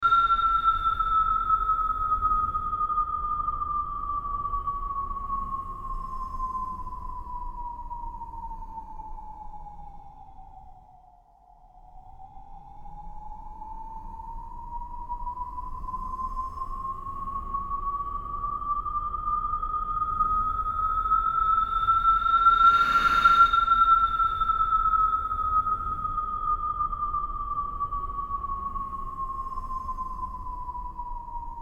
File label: slow reverb siren sound alert cinematic